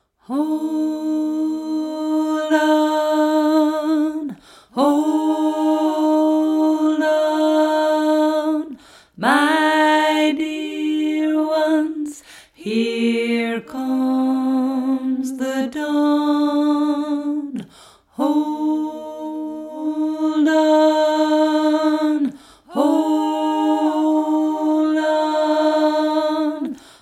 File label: Drone